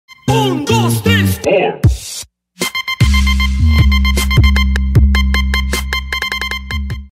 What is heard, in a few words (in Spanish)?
cumbia mix